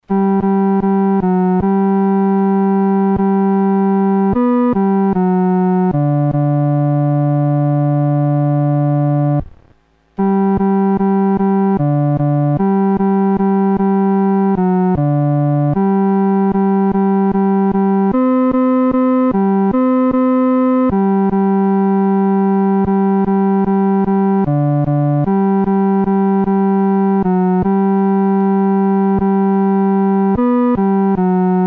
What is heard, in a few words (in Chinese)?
独奏 第三声